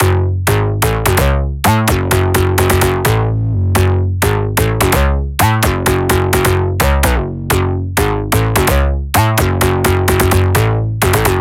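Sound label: 128BPM Bass Full Processed Dry noSC, F# minor; Demo Constr Kits; Let's Work It Kit; Bassline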